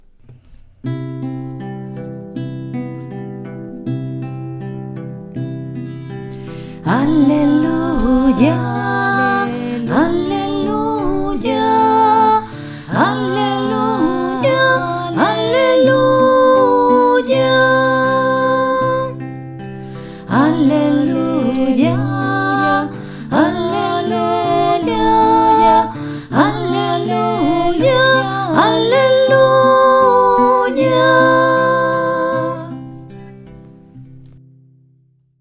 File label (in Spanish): ALELUYA SOLO CANTO; CANTO DEL ANGELLO; Misa 29 de noviembre 2020